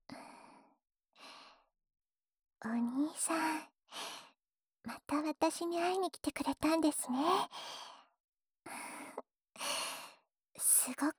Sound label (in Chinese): 即兴舔耳（右耳）, ASMR